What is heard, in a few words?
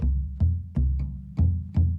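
Calm Far, music; From Dust; PS3; Xbox 360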